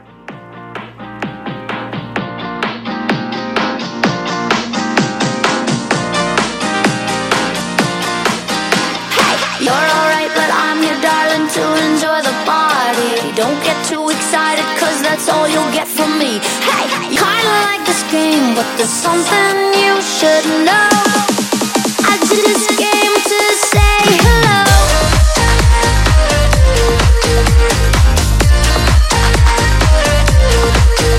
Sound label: Blend 128